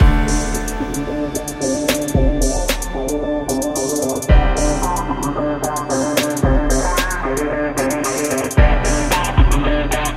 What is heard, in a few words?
Hip Hop Rock